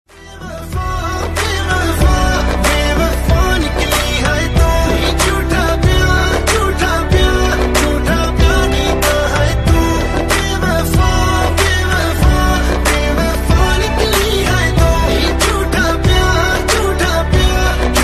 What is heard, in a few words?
love failure ringtone